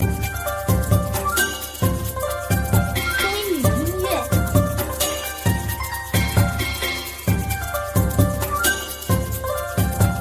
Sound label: music back; 同城斗地主